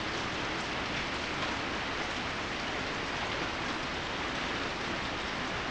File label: rain in; CLANNAD FULL VOICE; Key